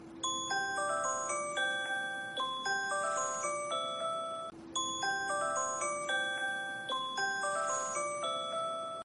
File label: alarm mobile tone